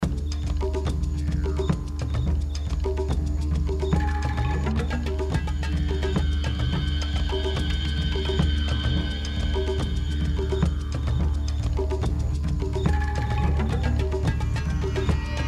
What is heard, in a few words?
Moldy Depths Level Music